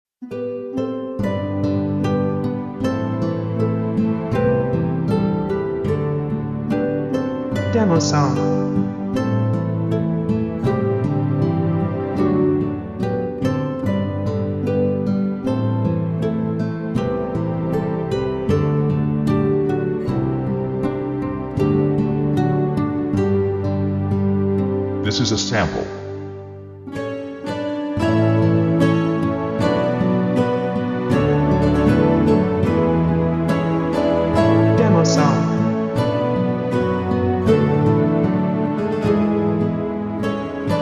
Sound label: Lyrical Guitar